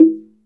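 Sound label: Slit Drums, soft; Roland LCDP11 Africa VOL-1; Wood & Metal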